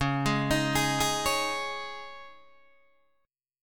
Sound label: Db Suspended 2nd Guitar DADGAD